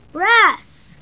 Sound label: WRASSE SOUND